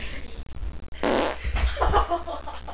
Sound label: fart